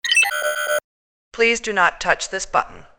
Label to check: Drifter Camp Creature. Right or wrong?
Wrong